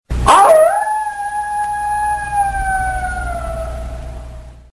wolf

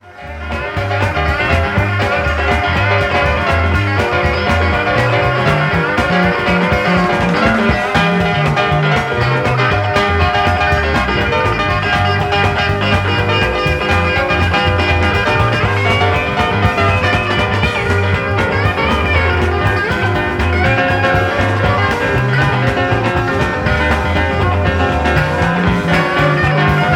solo, ringtones

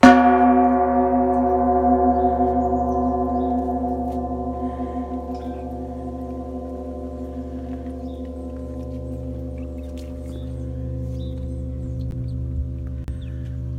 gong

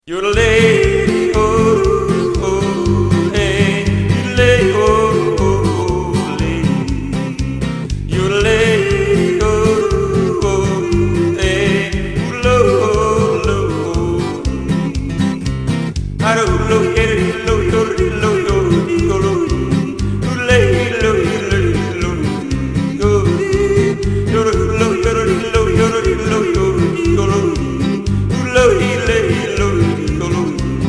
yodel

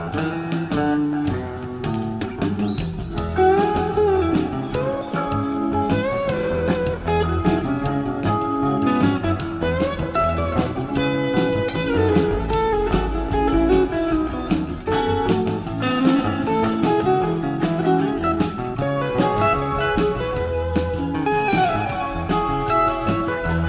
Blues solo; Memphis

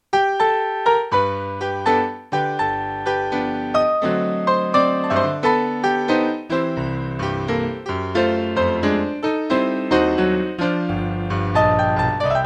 Barrelhouse Blues, ringtones

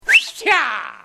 the whistle

